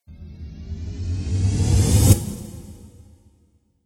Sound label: Dance Riser; Rise And Dive Sound Effects; sound design